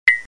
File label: blink; davidson